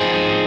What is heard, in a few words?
8 9 8 10 8 Fm7